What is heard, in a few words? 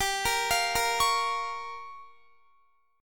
G Minor 7th Flat 5th, Guitar; Gm7b5; arpeggiated; x,22,20,22,22,20